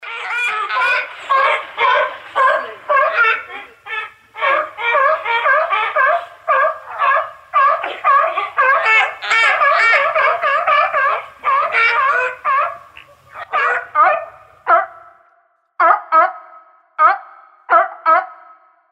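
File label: fur seals scream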